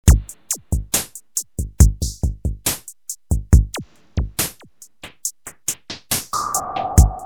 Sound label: Kawai s5000